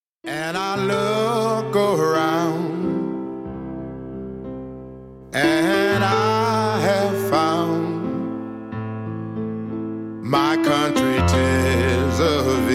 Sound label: red Neck Blues